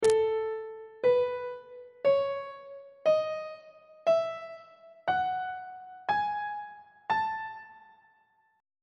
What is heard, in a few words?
a lydian mode; on treble clef